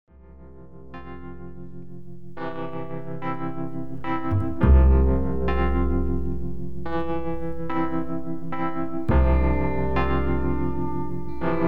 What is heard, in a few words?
New Orleans instrumental